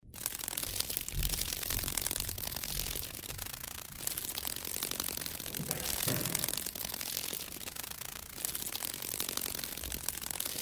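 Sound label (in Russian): майский жук звук крыльев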